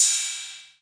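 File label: 808 cym, cymbal, drum machines; 808 Extended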